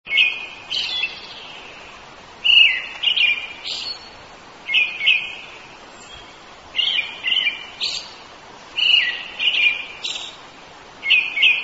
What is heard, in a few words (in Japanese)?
akahara